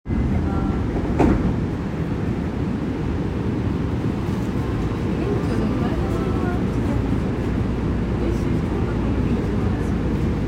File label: train sounds; 西武新宿線東長崎東